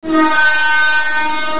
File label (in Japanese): keiteki